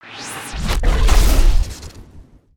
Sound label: super jump full